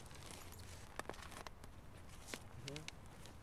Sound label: Digging Sounds; Bremen